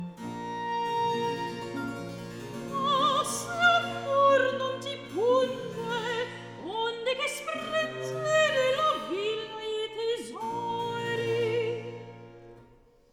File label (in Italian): Recitativo; Ma se Amor non ti punge; Baroque; Alessandro Scarlatti; Duet Cantatas